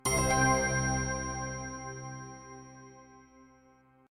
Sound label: Linux Mint Shutdown, Operating Systems Soundpack